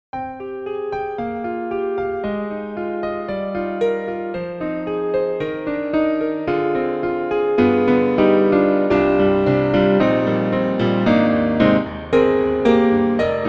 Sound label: Concert Grand; Native Instruments; New York Concert Grand